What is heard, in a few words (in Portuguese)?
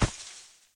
pegada; skibiditoiletattack